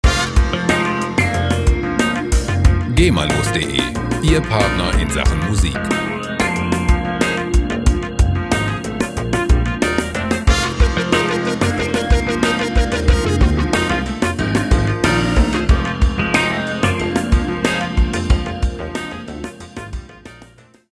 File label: groove